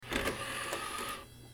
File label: cd tray